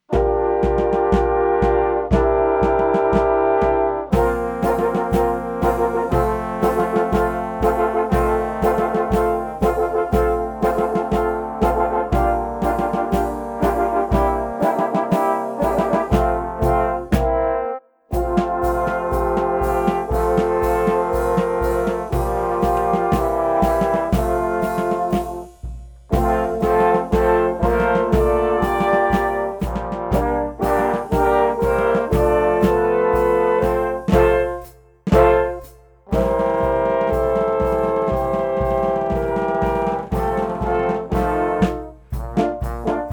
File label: Marseillaise soft version sans lead